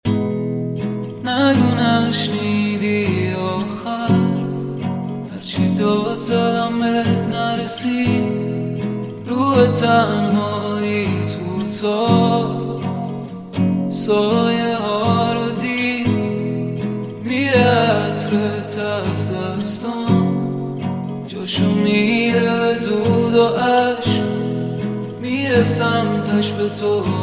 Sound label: Acoustic Version